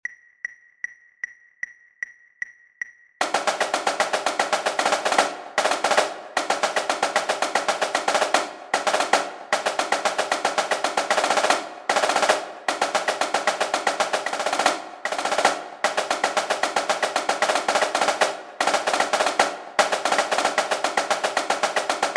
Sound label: sequence trip snare